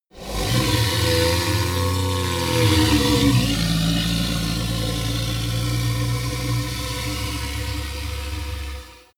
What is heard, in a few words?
golem growl domination long; schreie